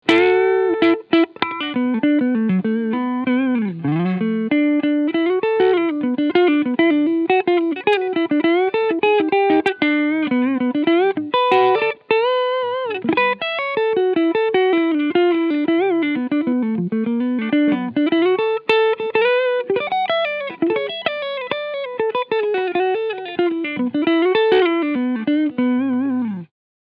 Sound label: Les Paul Cleansound Neck PU; Gibson Les Paul Tribute STB